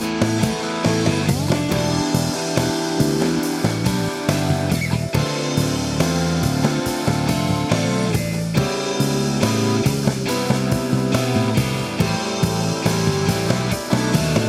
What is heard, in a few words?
rock remix